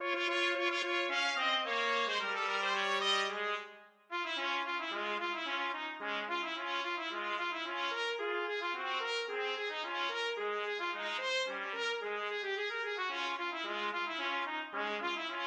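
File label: radetsky march trumpets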